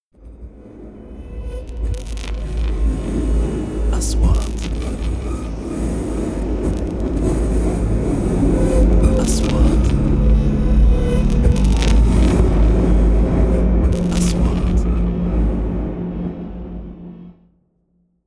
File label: 3D SFX Triler; مؤثرات صوتية